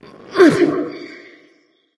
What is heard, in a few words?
grunt lift light; smite; voicepack; hel